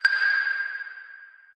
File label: chime